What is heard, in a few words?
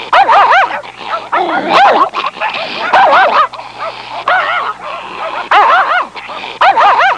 Little dogs barking